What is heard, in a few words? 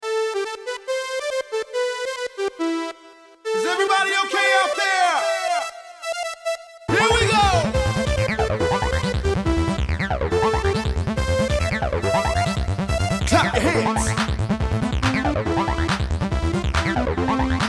jazzy sharp